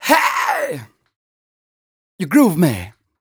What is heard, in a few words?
m 130 mel d-flat, Vocals